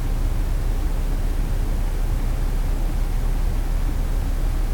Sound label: VENT NOISE; 1000fx; ROOM NOISE